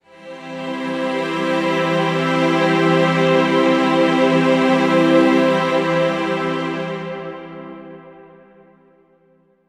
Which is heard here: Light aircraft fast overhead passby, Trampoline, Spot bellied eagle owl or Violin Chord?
Violin Chord